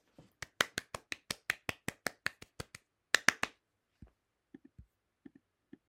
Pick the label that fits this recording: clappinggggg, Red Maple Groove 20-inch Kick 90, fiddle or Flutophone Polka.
clappinggggg